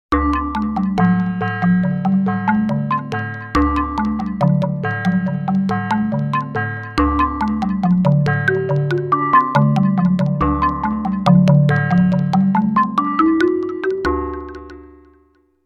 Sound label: FM Mallets, v-synth